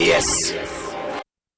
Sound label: Processed Vocals, Loops